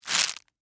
Forest Branches, Footsteps, Forest, Sticks, Branches, Single